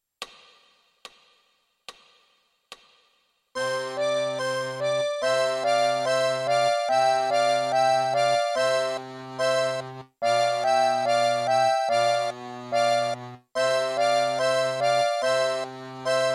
bassi a mezzi

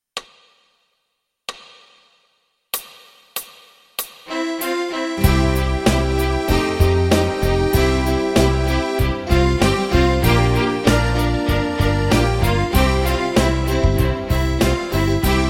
Fisa tutti

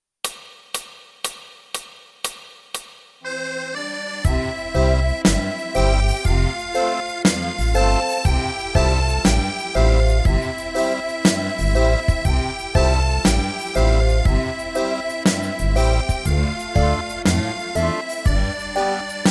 Fisa unite tutti

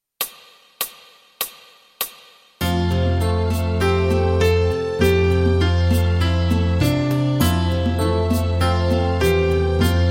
Piano tutti

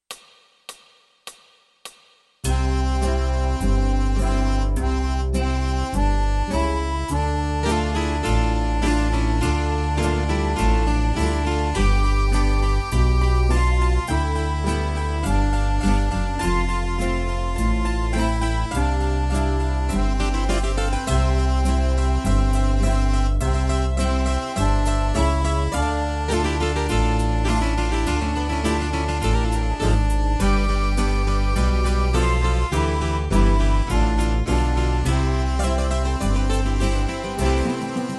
Fisa Unite Tutti

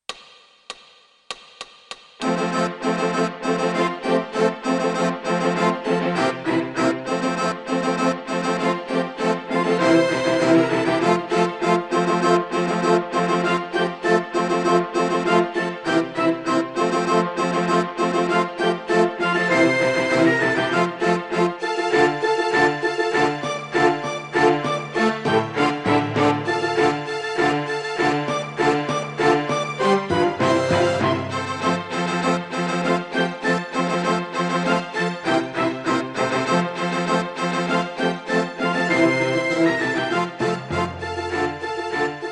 Fisa tutti

